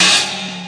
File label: grunge cymbal; DRUMS (SINGLE HITS)